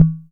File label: midtom, Yamaha MR10, drum machines